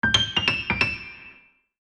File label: piano flourish